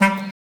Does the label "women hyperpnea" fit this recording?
No